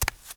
foot; slender; zombiebm

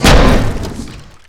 garg hit; slender

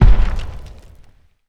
garg close step; slender

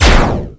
main shot; tron mod; weapons; cow mangler

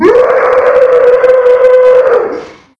chase; slender; trypophobia

